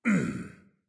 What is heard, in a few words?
lobby landing; smite; chaac